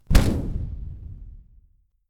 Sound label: Explosion